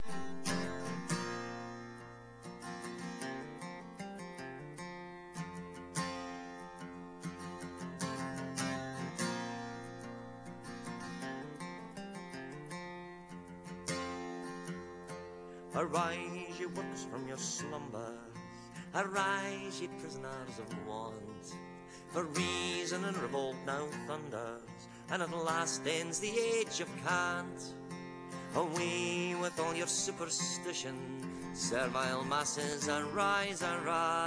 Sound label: the internationale violao e voz